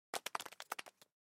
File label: clap